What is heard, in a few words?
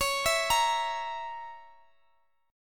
Bb Diminished Db